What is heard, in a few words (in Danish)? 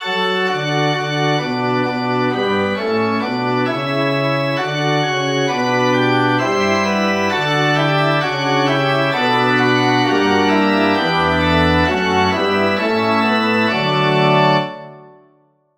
forspil